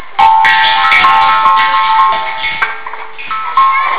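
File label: suikinkutsu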